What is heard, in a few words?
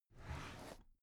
drawer cabinet carton open regular halfway